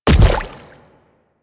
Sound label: Step Water, Footsteps; Enemies; Warframe; Infested; Grey Strain; Splitter